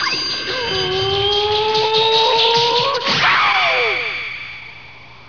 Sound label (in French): bruit